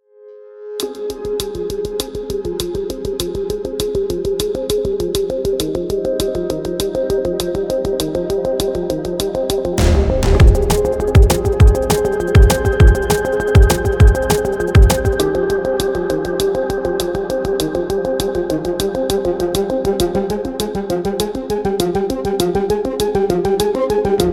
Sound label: Layout atmo; game project parsec